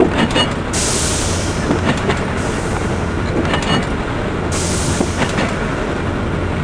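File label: water wheel; ShadowMan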